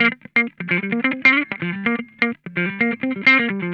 BUSY, Funk Guitar; Zero G - Funk Guitar

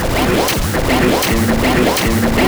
SNEAK; USB Soundscan vol.33; AKAI; Disjointed Textures; RYTHME

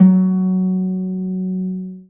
STR HARP, STR Orchestral

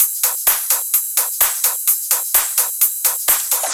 Drum Loop HH Clap; VEC5; Drumloops; Multi Loops

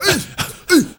HEY HEY, MALE NOISE, Heart of Africa; Spectrasonics; Heart of Africa 1

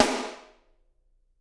SD, Drums; Bob Clearmountain Drums I

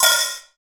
bali cymbal close, cymbals gongs

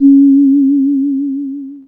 C#, Synclav FM, WIND; Big Fish Audio

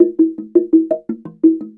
SLIT DR PH, SLIT DRUM; Spectrasonics; Heart of Africa 2

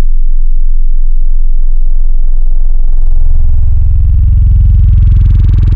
Subtle Wub, Serum Wavetables; Antidote Advent

